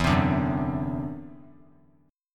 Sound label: E 7th Suspended 2nd Sharp 5th, E, down; E7sus2#5; strummed